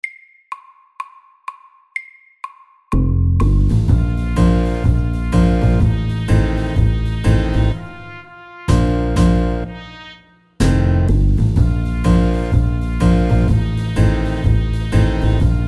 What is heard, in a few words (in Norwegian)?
Godteri FAST 125